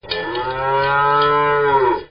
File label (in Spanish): Mugido de vaca; estuario sup oka